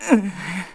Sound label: Wall Hit Recover